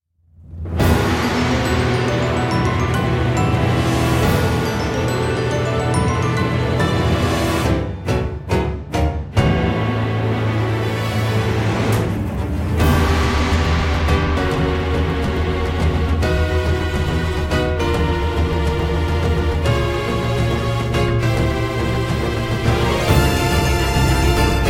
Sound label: water battle; spirit beast